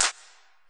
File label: clap, Kurzweil K2000; Drums